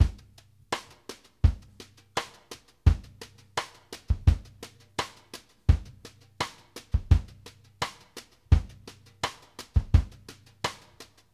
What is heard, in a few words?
BRUSH CL, BALLAD 84; Sampleheads; New York City Drumworks VOL-1; SP BALLAD 84